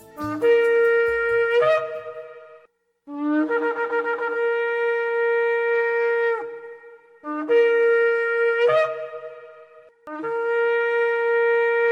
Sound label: shofar; weekly devotional